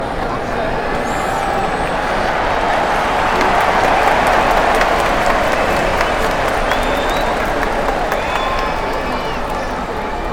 cheers

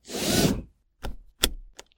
seatbelt on; car

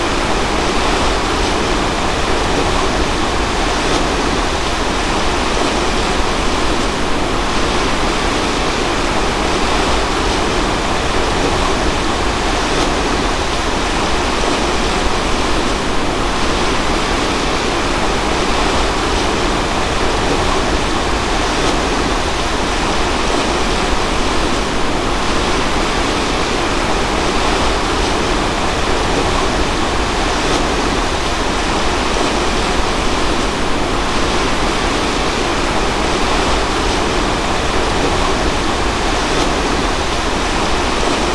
river rising

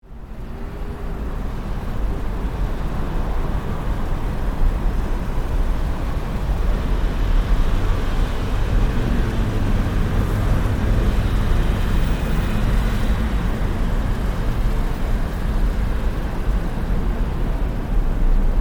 city daytime

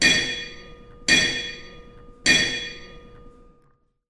tko bell

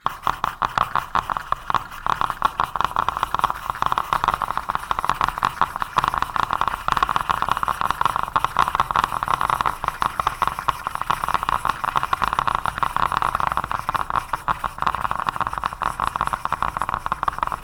school exam

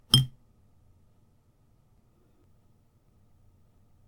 drops on plate, 皿に水滴; 皿に水滴２; wetarea

